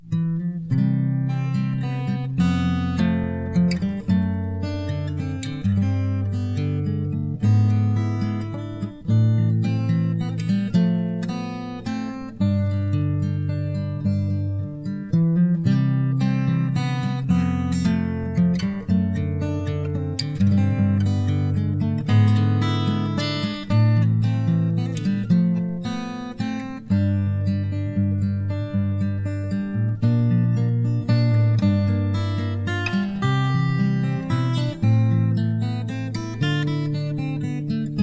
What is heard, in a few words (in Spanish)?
Oda para guitarra